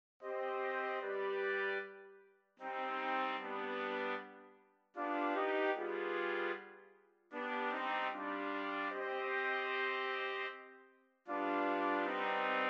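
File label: 4 trumpets; trumpet quartet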